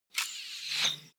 bow and arrow fire fly; krieg-waffen; pfeil-bogen; soundpool

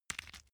small pepper grinder

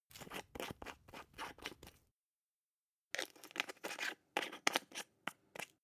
glass pepper cap screw down; soundpool

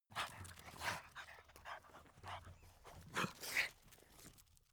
dogs snuff; natur-tiere; hunde

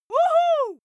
female cheer wohoo; schreie; menschen

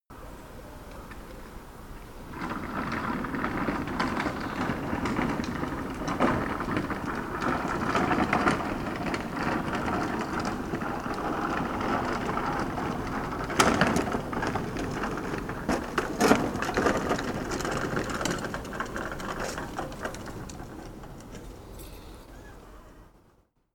dragging lawnmower; haus-garten

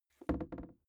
briefcase plastic creaky inside pocket

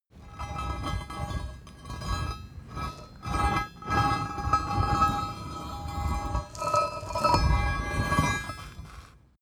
metal scrape long

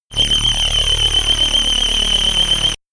shot annoying tooth low; zaps buzzes

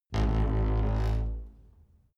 wood door creak open

